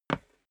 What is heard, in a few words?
step boots wood parquet slide short